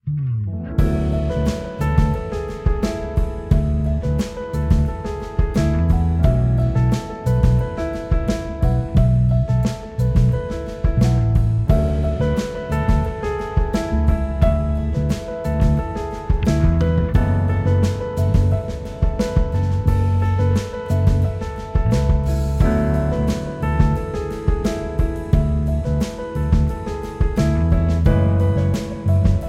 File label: Battle Lose Result; Tales of Crestoria; Android